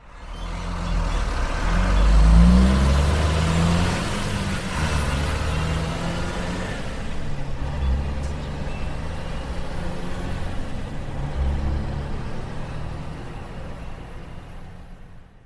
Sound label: Transfer Truck Driving Off